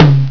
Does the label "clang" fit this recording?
No